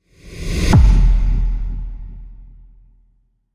hissing whoosh to deep hit, film trailer cinematic; audioblocks